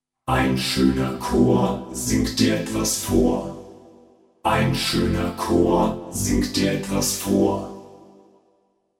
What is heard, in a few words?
VP550 Classic